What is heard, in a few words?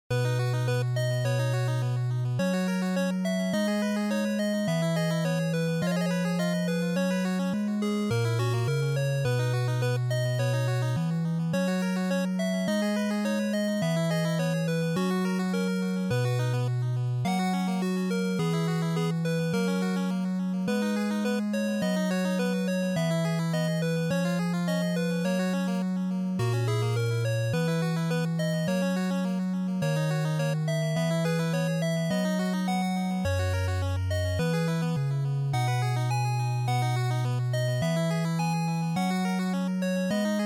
RPG Ending Fade Out, bgm